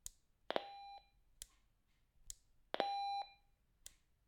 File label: Walkie Talkie